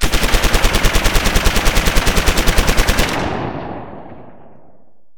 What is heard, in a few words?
Gun, Machine, 9mm, Long Burst; gun and weapon sounds; captain audio; fully loaded